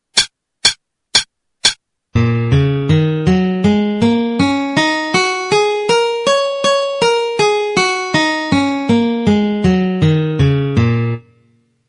Eb Minor Pentatonic, 160

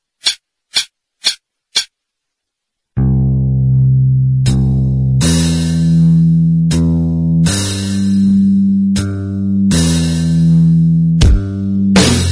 intro backing

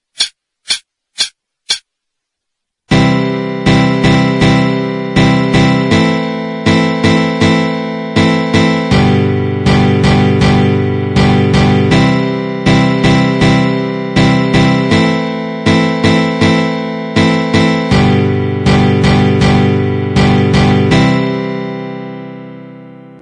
Easy Guitar, 80

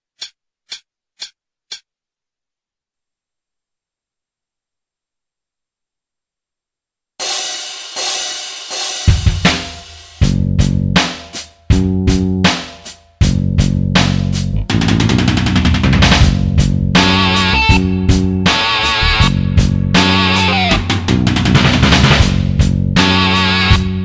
intro backing 80

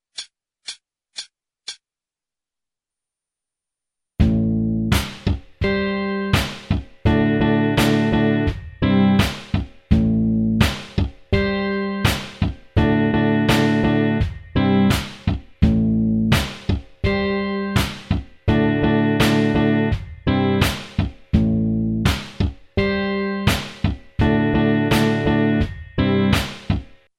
triad groove a minor dorian

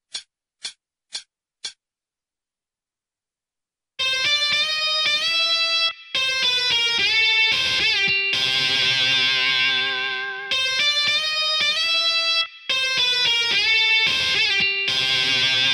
solo 110

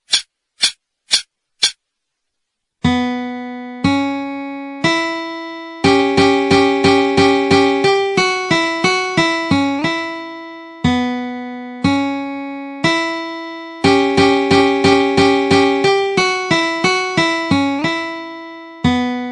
Acoustic Guitar Blues, 60

